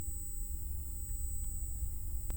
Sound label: Hydrophone